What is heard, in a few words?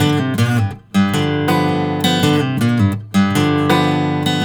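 baritone riff, Blues Guitar; ILIO; Fretworks; 108 BARI RIFF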